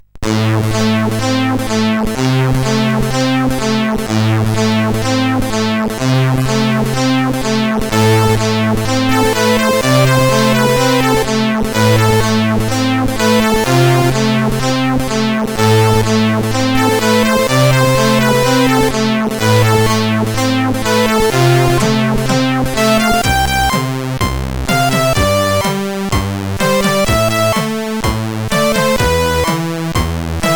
Ruski voz, sid CSG8580R5

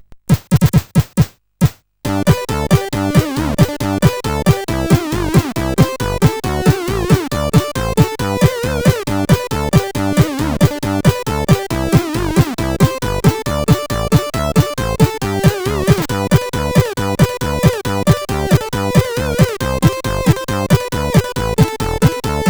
Humppa Bells, CSG8580R5

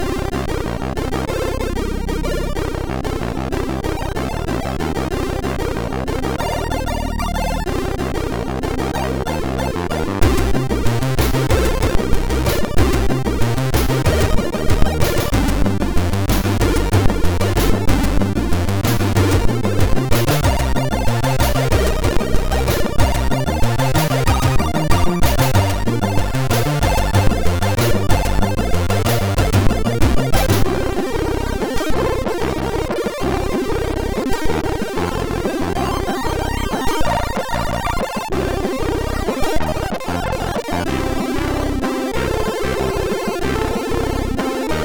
Funk, MOS6581R4

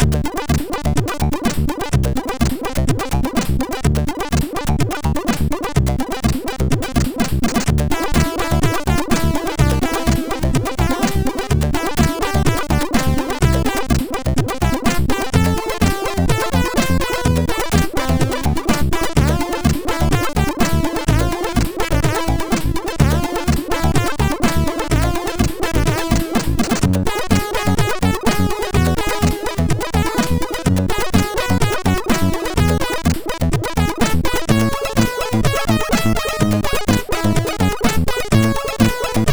Funkriff, sid MOS6581R4

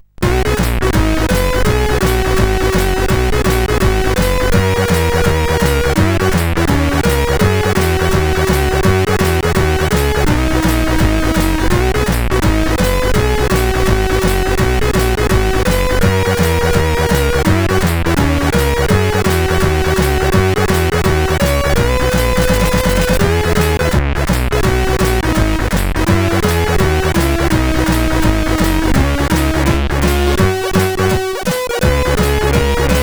Jazz, CSG8580R5

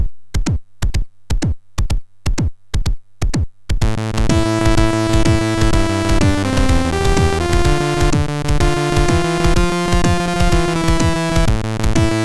sid MOS6581R4

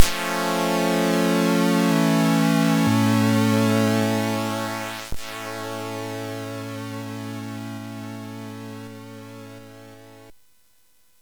Platform Game, sid CSG8580R5; hvsc

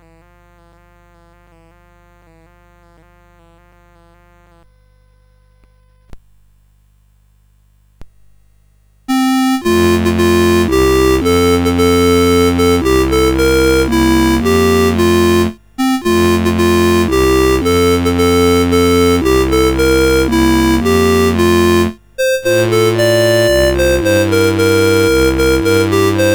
O Tannenbaum, CSG8580R5

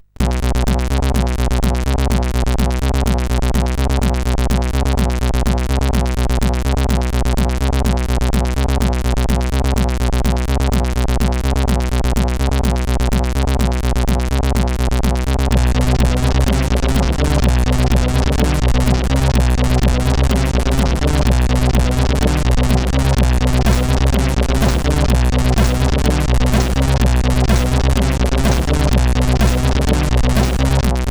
Hardtechno, sid CSG8580R5

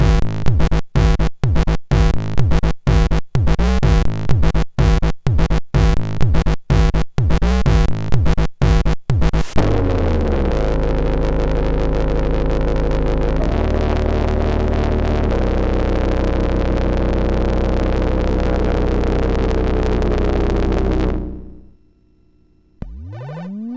sid MOS6581; hvsc

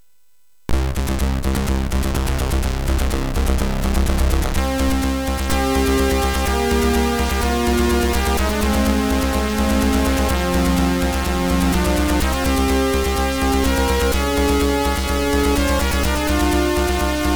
Music Demo Version, MOS6581R3; hvsc

